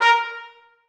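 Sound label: trumpets staccato a#4; Sonatina Symphonic Orchestra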